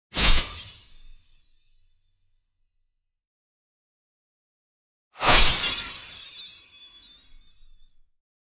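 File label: quick precision sword slash; attack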